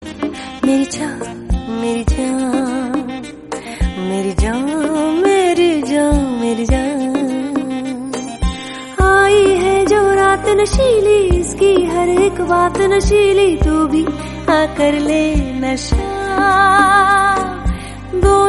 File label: instrumental piano; gangubai